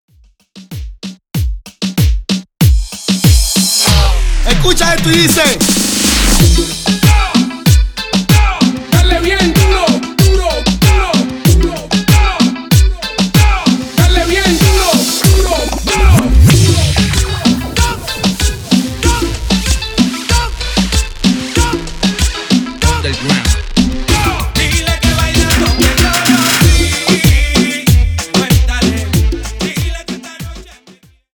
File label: Remix Intro Outro 95 Bpm; DJ Kamilo Remix: Master of Tropical Beats salsa, merengue, bachata, cumbia, reggaeton, and vallenato